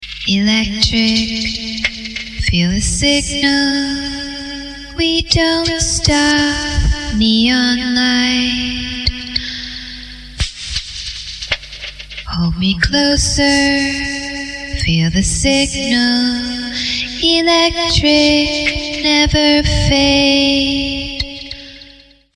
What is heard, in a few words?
vocals